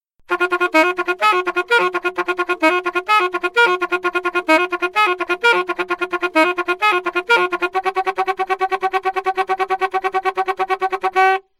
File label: altosax